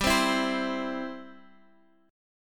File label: E-Minor Sharp 5th